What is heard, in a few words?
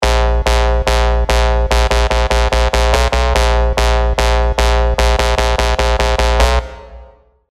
COMP GARAGE BASS